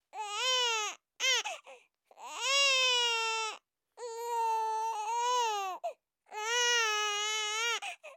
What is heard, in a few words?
baby cry loop